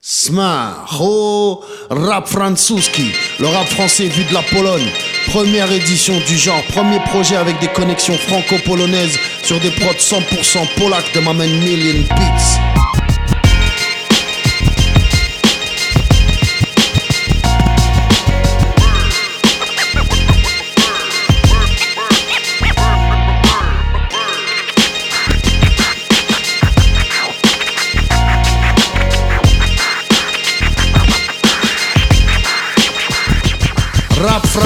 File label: scratch dj mono